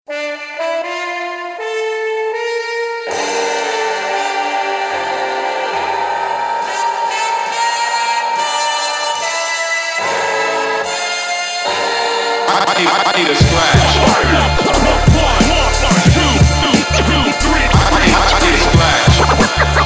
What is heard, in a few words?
big beatz